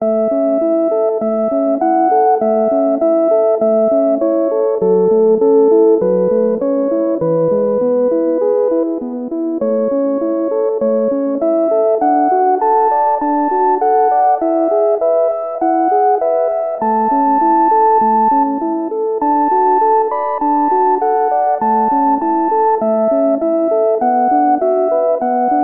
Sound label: five melody